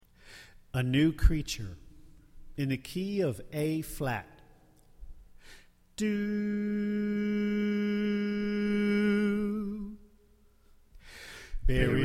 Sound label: Alto Left